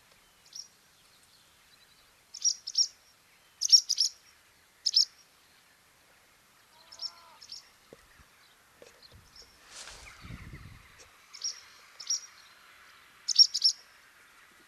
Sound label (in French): hirondelle rustique appel